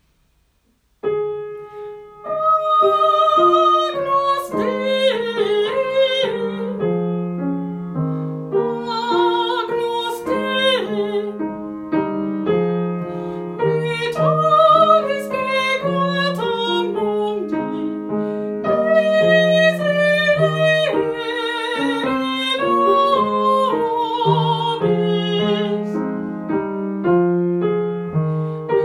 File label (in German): Croce Agnus Sopran